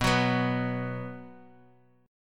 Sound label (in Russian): Akkord B5 boj